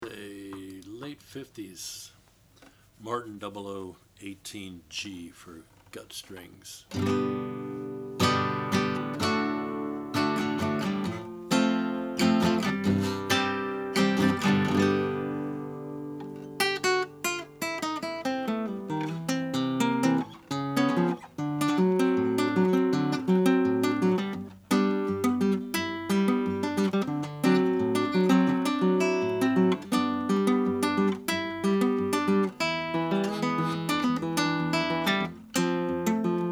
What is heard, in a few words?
martin 00 18g natural, Guitars Classical & Nylon; 1958